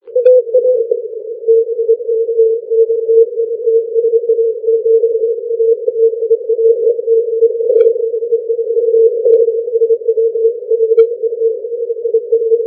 80m CW

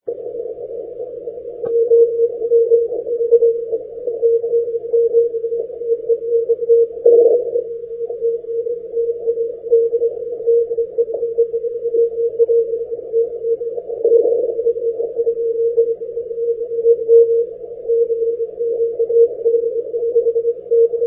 80m cw

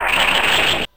SWOOSH

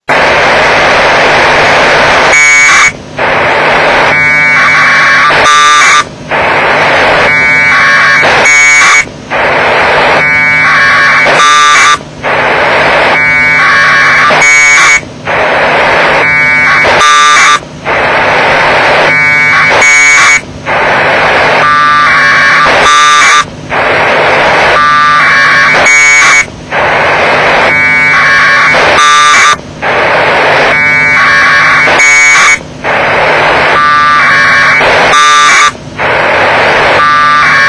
ISS packet QSO

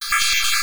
ALARM SHORT

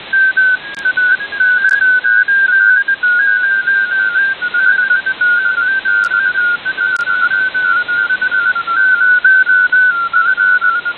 cw; Maya-5 6

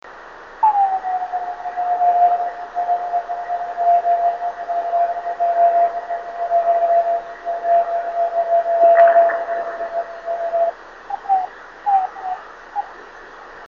TEP flutter Fade 26510KHz; AME

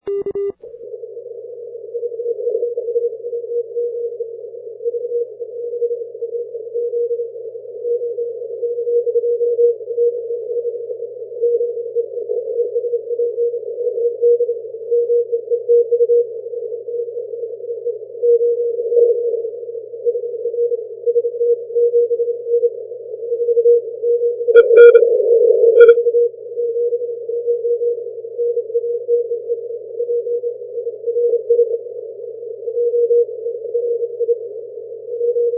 80m cw

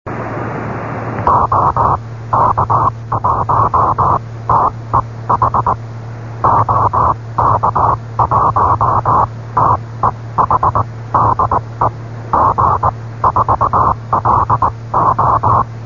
cw

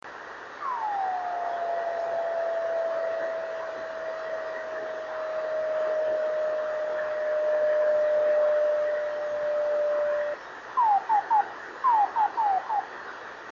28131KHz

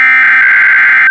afsk; wxalert

